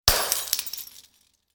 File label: Smash Glass